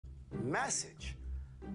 Nada Dering Circle Message

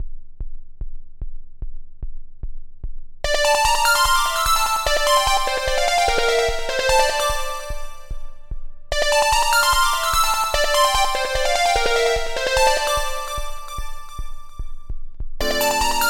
Nada Dering Nokia Jadul

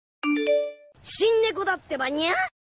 Ringtone Pesan; Uzumaki Naruto

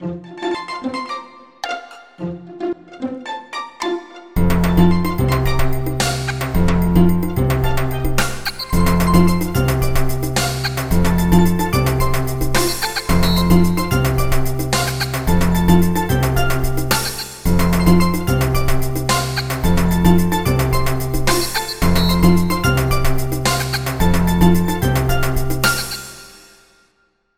Nada Dering Nokia Jadul Festival

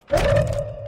Nada Dering Circle Closing

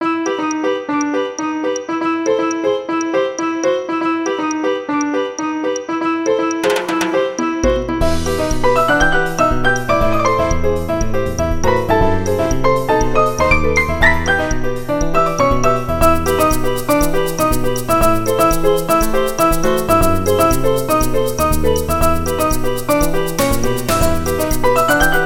Nada Dering Nokia Jadul Montuno

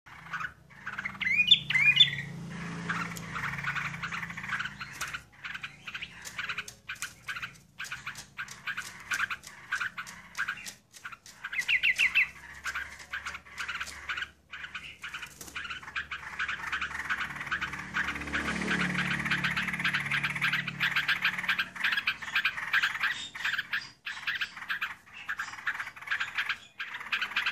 Suara Pancingan Pikat Burung Trucukan Gacor

